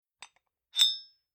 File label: Bar Wine Glass Liquor Decanter Closed Glass Ring Out; Drink Mixing Sound Effects